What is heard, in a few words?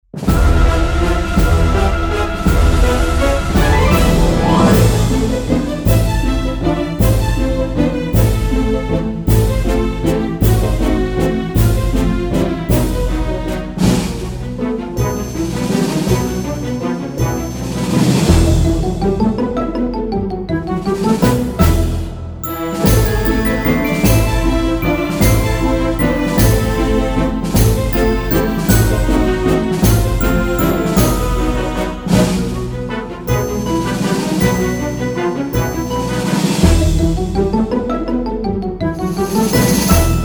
free spin music; fortuno the great